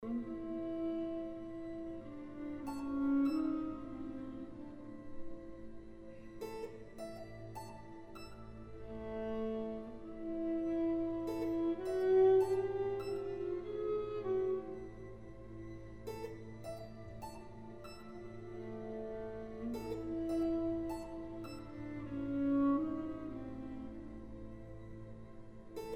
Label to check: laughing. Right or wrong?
Wrong